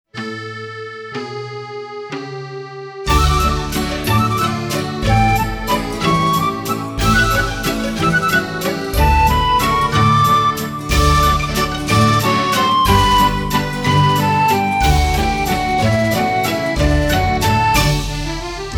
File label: musette